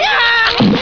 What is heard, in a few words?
death; kenny